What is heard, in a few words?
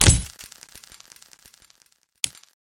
radar booster flash; freak fortress 2; employee